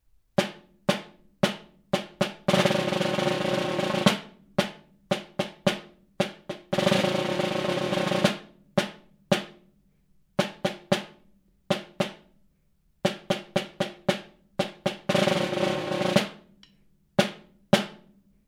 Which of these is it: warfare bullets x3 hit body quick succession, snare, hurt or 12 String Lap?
snare